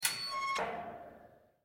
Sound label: Door open; Granny 3; Android